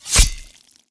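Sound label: knife hit, weapons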